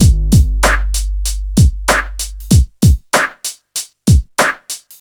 96 bpm drum loop g key, Free drum groove - kick tuned to the G note. Loudest frequency: 1523Hz